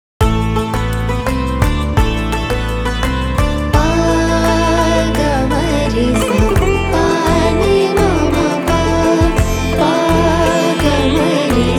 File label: Ringtone